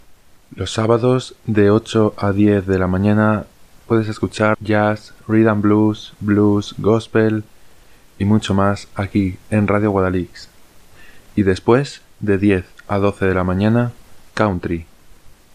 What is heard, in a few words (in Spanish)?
jazz sabado